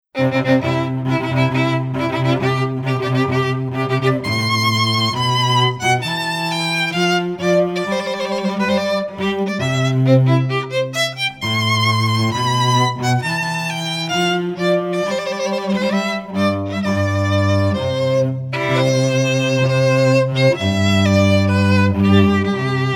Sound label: RECESSIONAL (DUO)